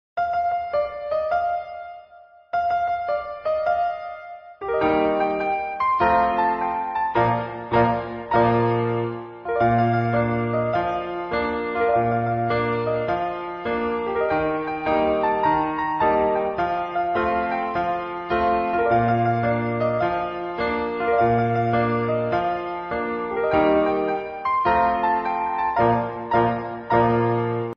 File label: round and round instrumental ringtone; Squid Game Season 2 Netflix; mingle game